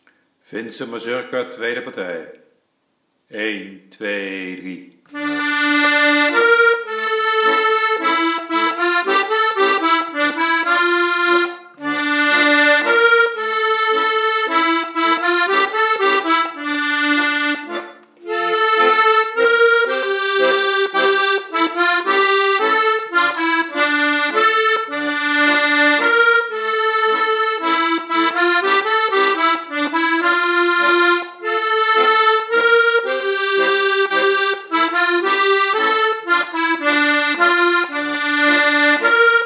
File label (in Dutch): finse mazurka tweede partij